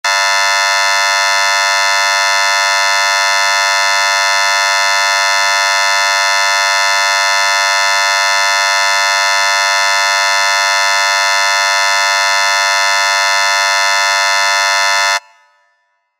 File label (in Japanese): 劇場やホールの開演ブザー リバーブ有り; 15秒; 戏剧音效